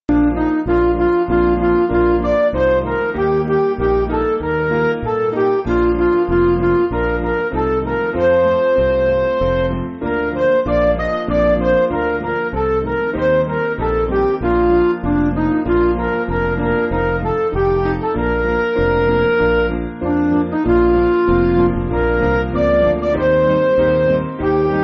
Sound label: Midi